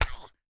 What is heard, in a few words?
goblin hurt